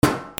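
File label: percussion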